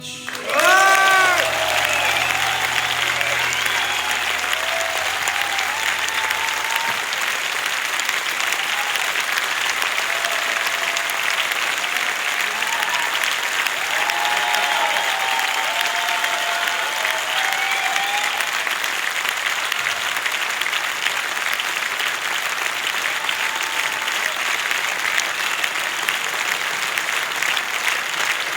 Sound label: Applause, Live; Live 2022-04-19 - Stereolux, Nantes, FR